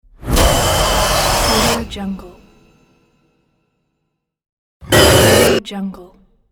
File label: horror dramatic demon scream